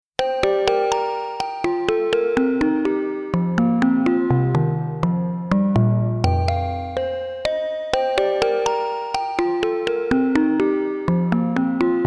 Sequential Trigon 6 Clickbell

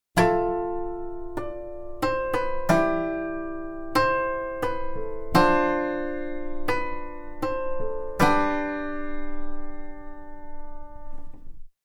Cimbalom

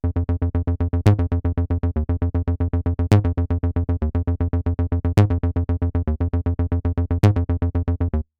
Accent

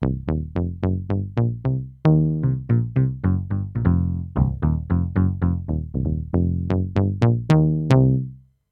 FM Bass; FM Lately

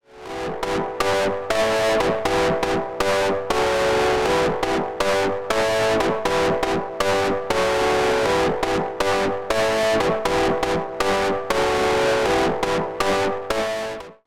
AKAI MPC LIVE II Insert Distortion AMP

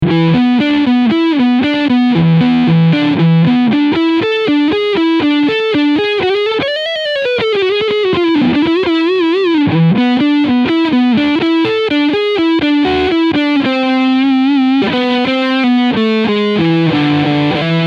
Heritage Guitar H 150 Lead Sound Neck Pickup

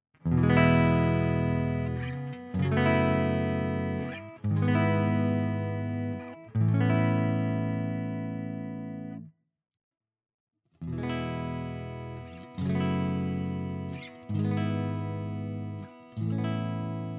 RGT1270 clean all positions Chords

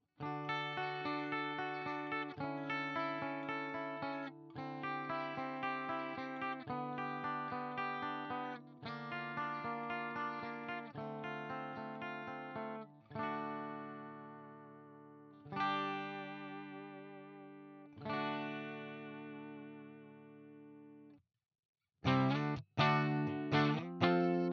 Gretsch White Falcon clean bridge

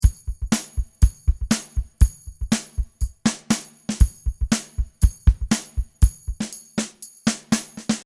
Moto Groove Boom

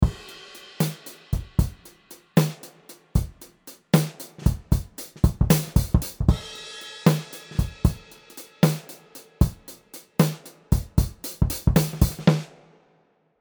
Addictive Drums 2 Vintage Dry Kit

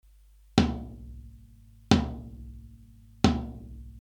14 x 12 Tom; Kirchhoff Test

